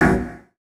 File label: chords